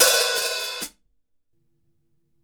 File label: HI HATS, Drumworks; Sampleheads; New York City Drumworks VOL-1; KD HI-HATS